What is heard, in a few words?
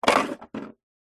household coffee machine drip tray plastic push in; nespresso